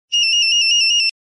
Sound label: Mosquito, Nokia 3310